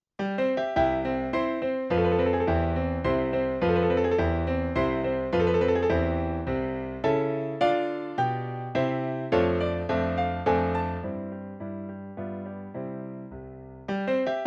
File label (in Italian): Sinfonia do maggiore III Minuetto Versione per pianoforte; detta "Sinfonia di Jena"